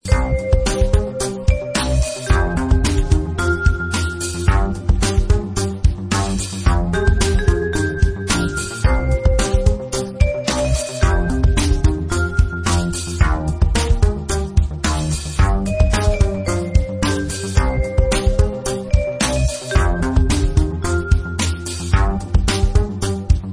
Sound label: disco lounge